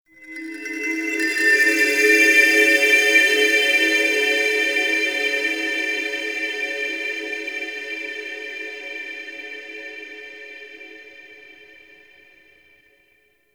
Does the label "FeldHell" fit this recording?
No